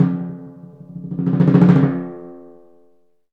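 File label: timpani roll; Roland LCDP03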